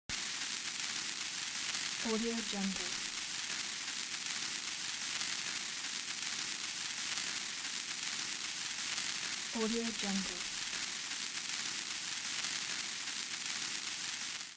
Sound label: bomb wick burning